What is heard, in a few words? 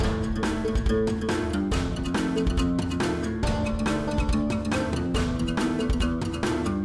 score win loop; Deathmatch Village; PS Vita, PS3